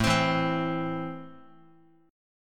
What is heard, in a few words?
A Minor A down Guitar; Am strummed; JGuitar